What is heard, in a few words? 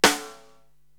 SD Jazz Ring, Korg TR-Rack